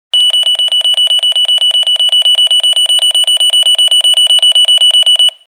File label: Alarm beeping sound